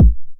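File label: 909 BD tape, kick drum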